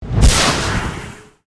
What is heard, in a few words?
spell chase; archer